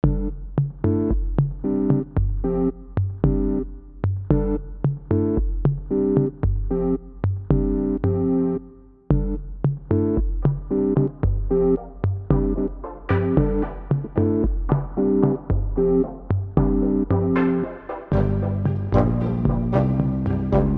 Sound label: space port, music